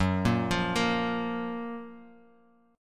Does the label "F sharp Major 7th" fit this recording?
Yes